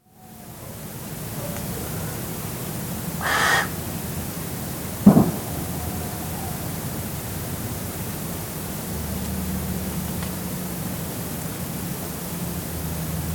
Farmstead in Summer, evening atmosphere with Barn Owls, distant Tawny Owls & distant dog; Outdoor Sound Effects; BBC